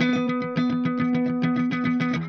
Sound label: Clean Funk Guitar